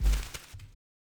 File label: roll; ludum dare 46; Player